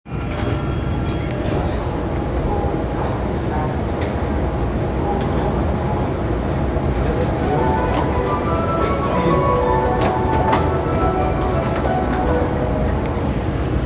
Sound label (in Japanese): 日比谷線人形町北, train sounds